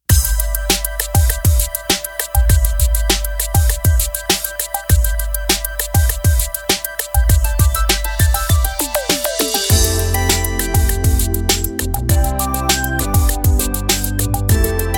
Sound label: Happy Music